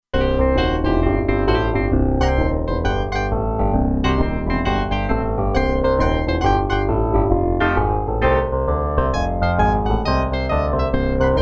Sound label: Loud-Piano, Roland Alpha Juno-2; Original Sound Chart patches